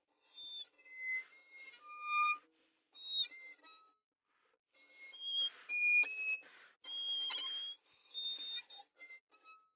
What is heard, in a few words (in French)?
détaché, Baschet; Groupe Geste; Geste Recherche; Extrait Conférence